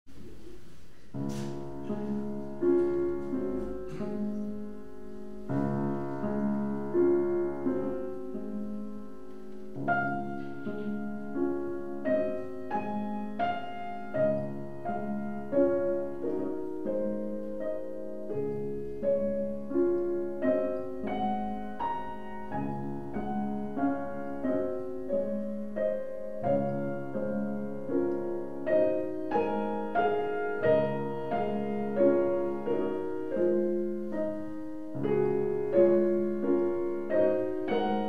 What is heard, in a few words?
Chopin Piano Recital Berceuse op.56 Pianist live